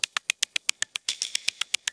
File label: camera shutter